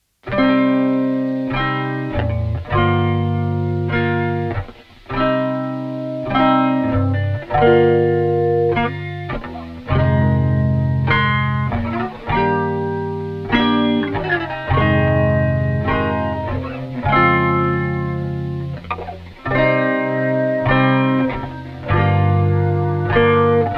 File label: Guitar; Kentucky Route Zero; Memory Overflow 2021; Hangar Guitar